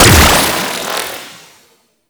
Droid Array Spin, Weapons; Warframe; Cephalon

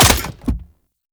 Shot Mech, Weapons; Warframe; Cedo Prime

